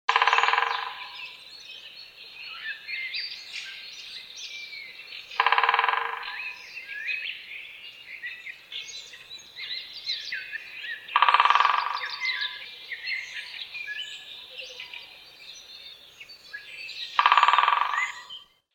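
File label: great spotted woodpecker voice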